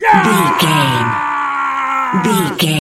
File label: voice male battle shout long